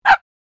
Small dog single bark

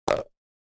Golf ball in the hole

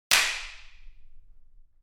Slapsticks single; drums and percussion

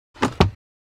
Airplane interior passenger tray table up; vehicle

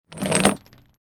Heavy chain dragging on floor

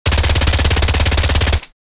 MAC 10 submachine gun short burst; war

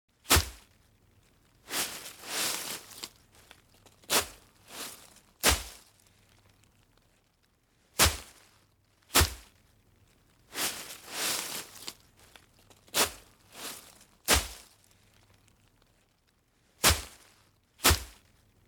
Foliage leaves raking on grass

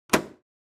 Epson ET printer cartridge compartment close

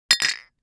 Glass bottles being arranged clinking together

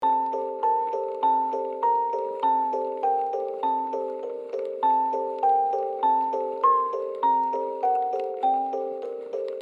Horror music box distortion loop

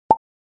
Cartoon pop

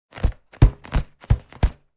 Inflatable beach ball taps hand hits

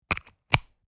Headphone jack insert; headphones and speakers

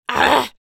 Woman yell loud and aggressive

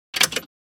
Motorcycle keys and ignition locking; vehicle

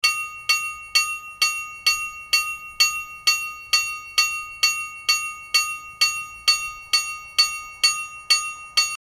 Train crossing bells ringing; vehicle

